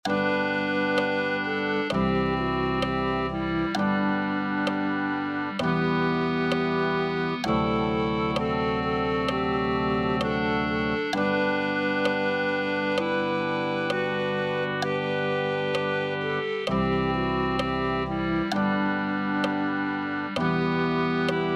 no pases de largo cristo clarinete

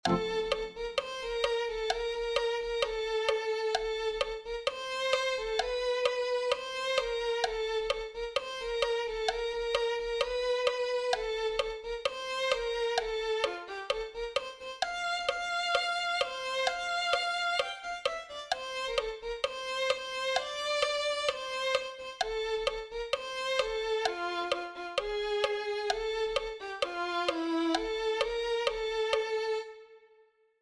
deja ir a mi pueblo violin

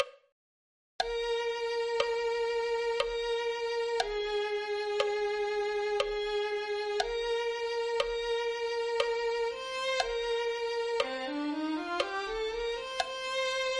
violin2

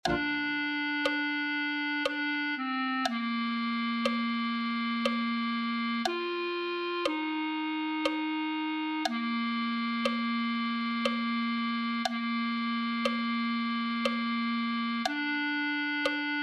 clarinete1

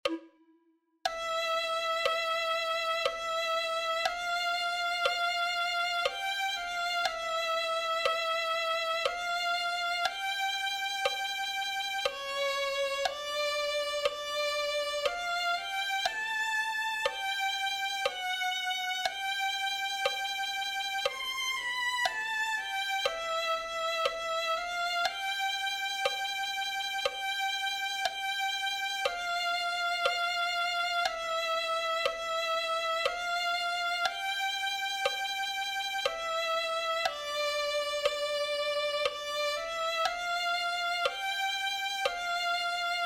haya una morada violin

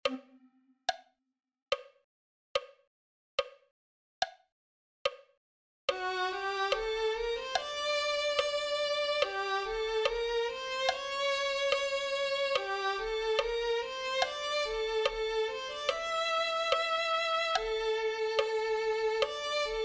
a dios sea la gloria violin

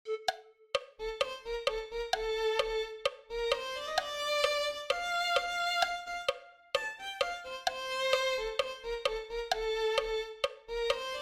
violin

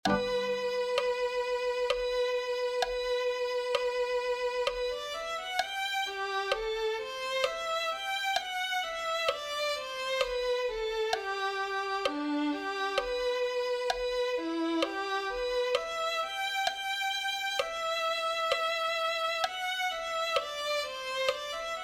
gracia oh dios violin

